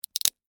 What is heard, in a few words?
Crack Crunch Single, Texture, Crack, Crunch, Single, Penne, Rigate; Food; BlastWave